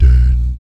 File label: PERC, Vocal, Jazz FX, BASS PERC